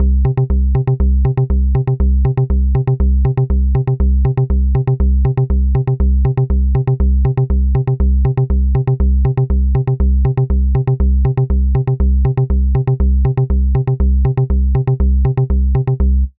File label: Bass dry